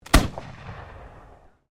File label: Musket Flintlock 75 Cal Brown Bess 1780 No Shot Firing, Black Powder firearms at a shooting range; Gun Shots